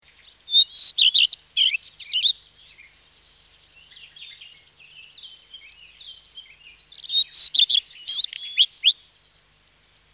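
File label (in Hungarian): Kerti rozsdafarkú, Madarak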